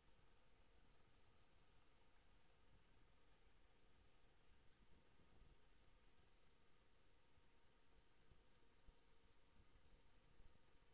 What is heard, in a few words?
HYDROPHONE 16000Hz; JASCO AMAR HYDROPHONE 740